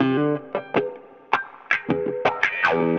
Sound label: Funky Wah Guitar; Guitars